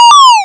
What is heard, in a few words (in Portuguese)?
alarme nave efeito sonoro